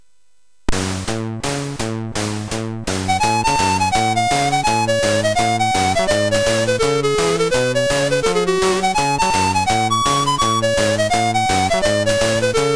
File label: Music Demo Version, MOS6581R3; hvsc